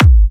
G, Kicks; markus hakala

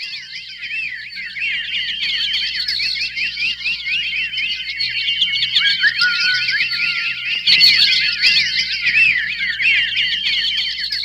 Bird, environments; Sonic Foundry (Sony Creative Software); Ambient Grooves

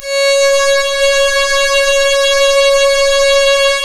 C#, strings; Big Fish Audio

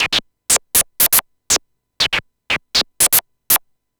Gated Seq Noise, 120BPM

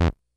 Bleep, Korg DS-10

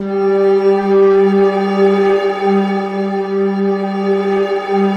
BELLPAD G2, Yamaha VP-1; Music Store; 90's Sample CDs

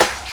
SD, Dance Mega Drums; Best Service; SD-HIP

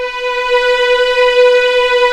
B4A LEG F, VIOLINS; East West; The Ultimate String Collection

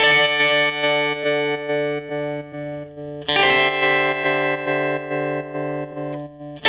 Strat Guitar Loop

